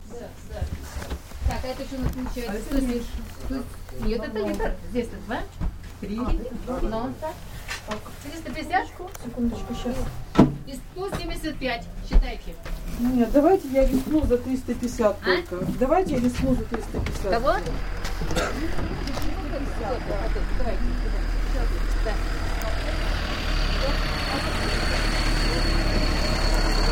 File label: sounds from a siberian train station